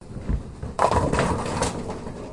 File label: bowling